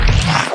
sortie

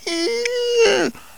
cri; Mobiclic46; LANGUES

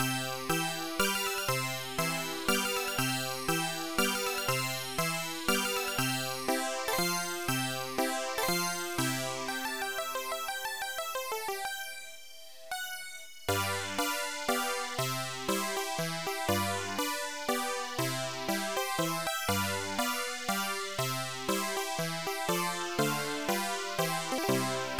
MENUET; Multimedia Toolkit

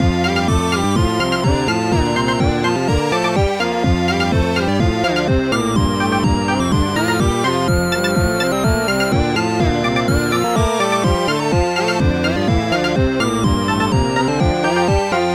musique; electronicgame; boat

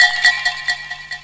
bulle

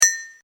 outil; MOBICLIC161

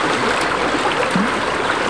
ruisseau; MOBICLIC41

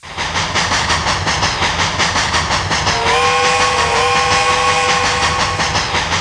tchoutch; MOBICLIC 13

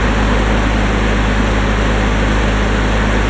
moteur van roule; Fire Chief; Vehicules

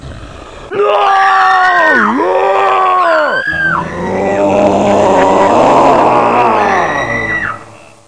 bruit; MOBICLIC37

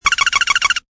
succes; MOBICLIC; MOBICLUB

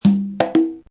peau; Mobiclic51

